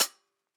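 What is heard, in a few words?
closed hi-hat; eddyflux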